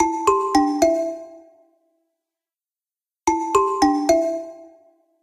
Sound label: incoming call